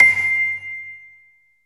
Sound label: CELESTA, JV-1080; Syntec; Wall of Sounds VOL-2; ORCHESTR-EXP